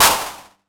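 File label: 909 clap; PERC TR; Wizoo - Platinum 24 Electronic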